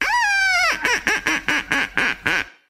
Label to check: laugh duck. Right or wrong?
Right